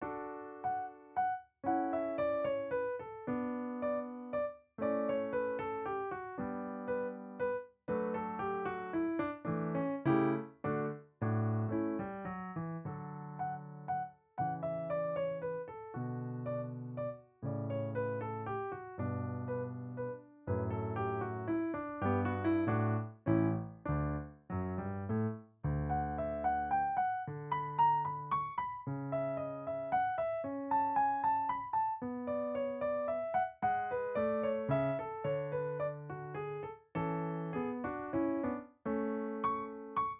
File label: Menuett